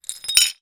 glass piece single broken small thick movement ground; zapsplat